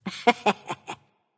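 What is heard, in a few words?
heh heh, female; smite; voicepack; hera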